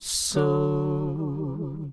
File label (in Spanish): salida harmonization, phase vocoder